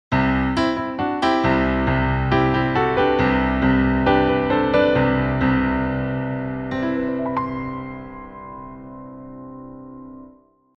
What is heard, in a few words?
Radical Jingle